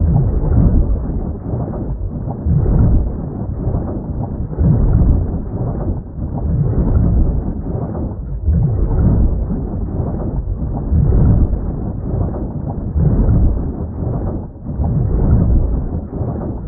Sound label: Hydrophone Movement Medium; Soundproof Walls 2.0